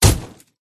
cat attack contact middle

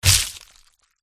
crab attack contact bottom

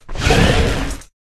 Rat block

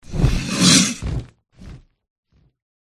dragonkin attack voice middle

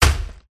forest spirit attack contact bottom

